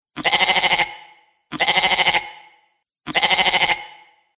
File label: goat sound